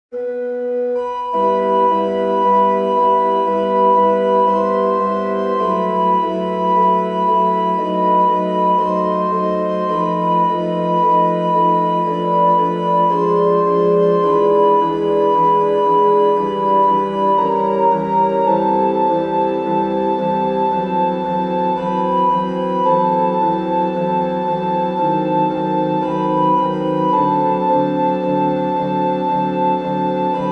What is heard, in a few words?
organ; chopin; prelude 4